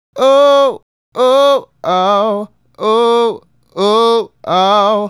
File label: Male Solo, Vocal